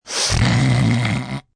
Snort Mucus, Snorting, male, lots of mucus (human voice)